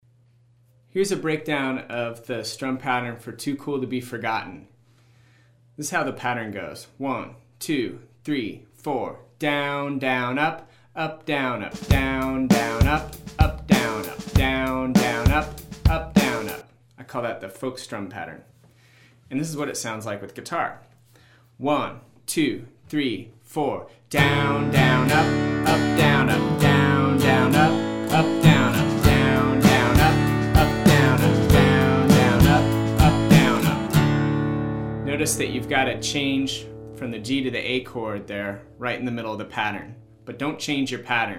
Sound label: Strum Breakdown